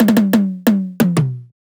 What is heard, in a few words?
tom worldwide; Drum One Shots; Slate; SD MIDNIGHT Sample Pack